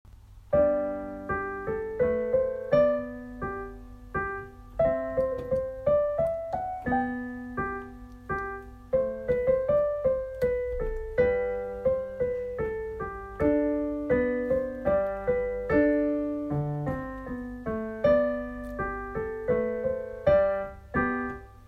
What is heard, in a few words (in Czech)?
klavir, Menuet G dur; Ch. Petzold